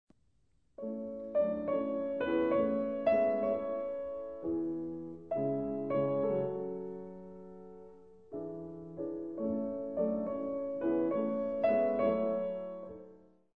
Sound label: piano, 2 pianos